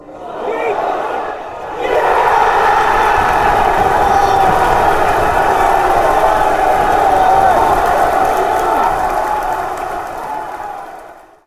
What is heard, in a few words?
goal, fanchants